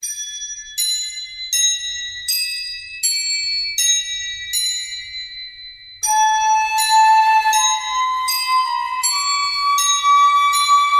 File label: Deep Wind Chimes